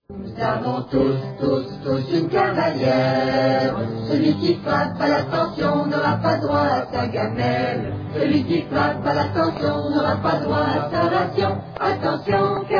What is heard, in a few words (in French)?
danse cavaliere, musique